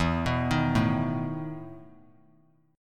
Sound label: E Major 7th Suspended 4th; arpeggiated; JGuitar